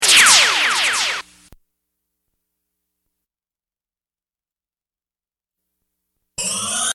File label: Laser burst